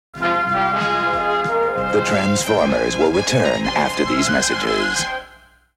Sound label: Commercial Bumper Sting